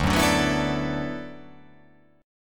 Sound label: D 6th Add 9th D